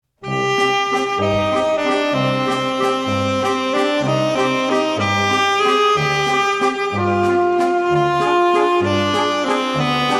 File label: waltz; the second waltz